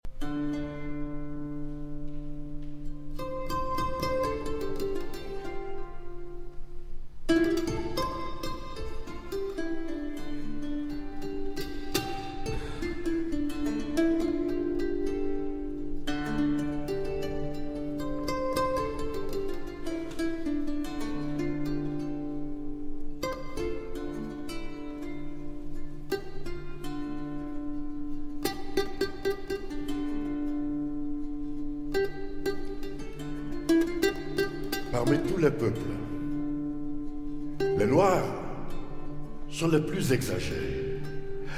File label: Taqsim and Narration